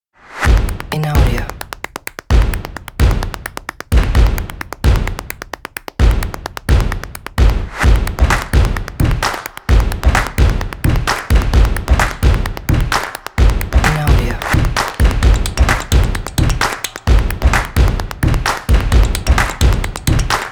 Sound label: Percussion Intro